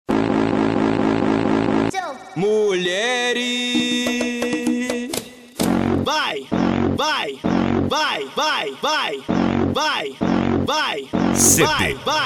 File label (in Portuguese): eletro car automotivo